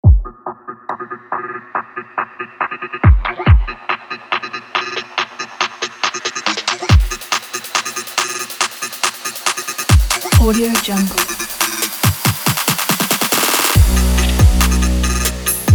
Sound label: trap workout; studiofa96